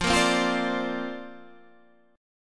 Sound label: D Minor 7th Sharp 5th, F